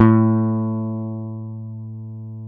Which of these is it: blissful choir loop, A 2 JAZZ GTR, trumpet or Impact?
A 2 JAZZ GTR